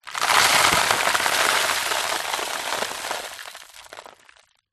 dirt debris small in plastic bottle tip movement

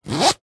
wallet leather pocket zipper med fast; foley; zapsplat

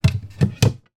bread bin lid wooden open; household; sound effects; sound effects one

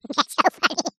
cartoon laugh high pitched says thats so funny

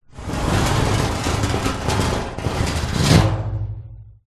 metal scrape resonate garage door; foley

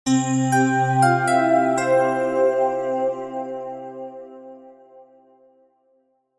multimedia signature corporate

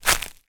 foley footstep bark chippings

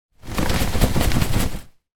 household mosquito net shake

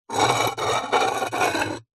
household pot ceramic base lid plate scrape

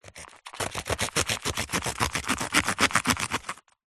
foley paper sheet cut with knife; zapsplat

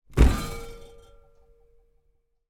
cupboard door open wine glasses inside rattle

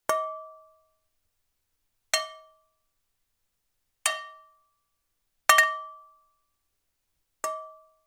impacts metal pan knife handle multiple

